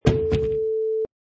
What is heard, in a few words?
Cabinet Door Shut